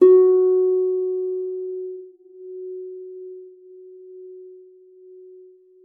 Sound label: HFEM F4, HARP; Xsample VOL-8